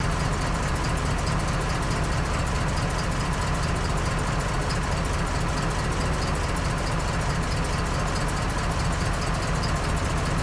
Jeep 3 Cylinder Idles, Jeep Cherokee 84

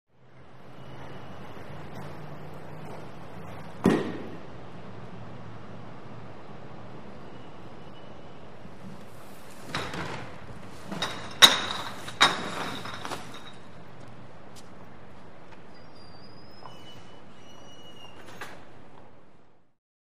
Street Ambience Quie

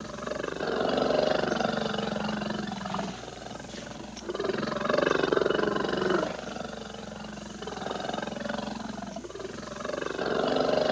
Bear Purr Growl

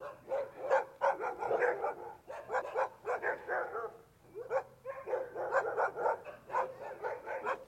Dogs Barking Angry, husky

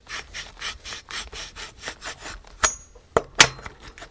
Bike Pull Seat Out Dow

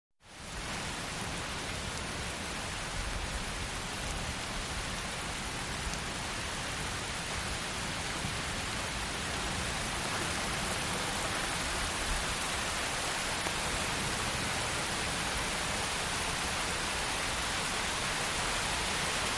Rain - Heavy Rain With Puddles

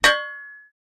Metal Hit Ring, small impacts

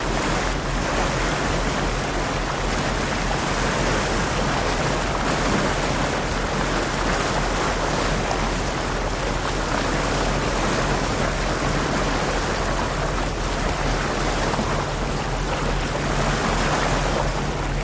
Bow Wash Off Cutter

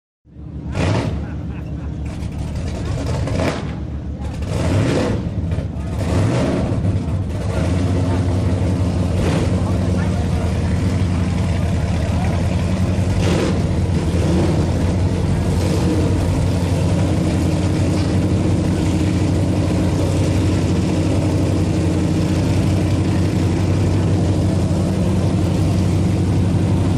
Power Boat Pit Ambi, off shore power boat

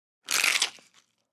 Chicken Crispy Bite

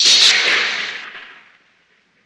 Thunder Quicker Bla, lightning

